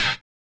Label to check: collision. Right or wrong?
Right